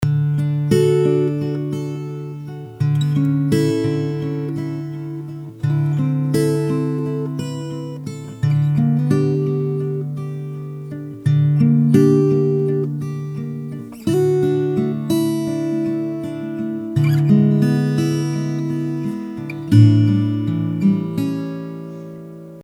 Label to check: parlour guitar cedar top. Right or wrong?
Right